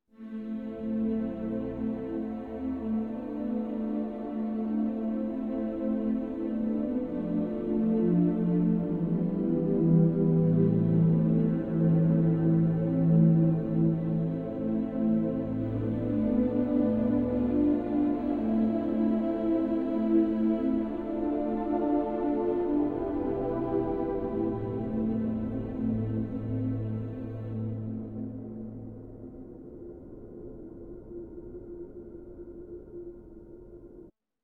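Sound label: Ambient Requiem; Shadowgrounds; windows gamerip 2006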